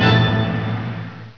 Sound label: ORCH HIT; Widget Workshop; A Mad Scientist's Laboratory (1996); Maxis